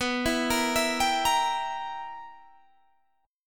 B Major 7th Suspended 4th Sharp 5th Guitar Open G, chord